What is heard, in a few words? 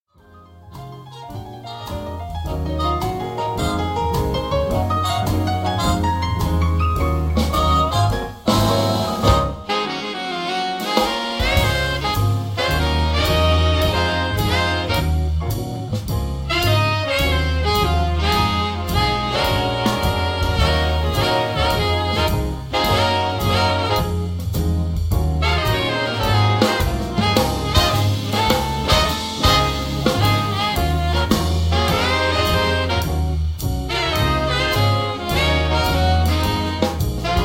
Jazz Ensemble